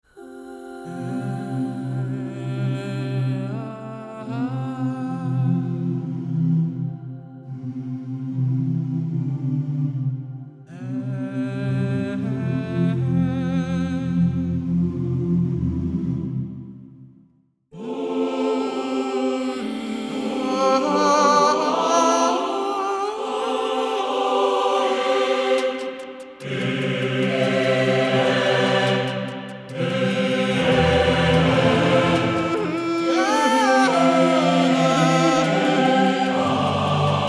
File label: Choir